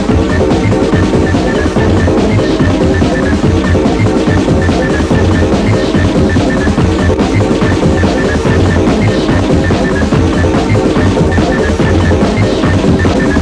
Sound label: rave; Slammin'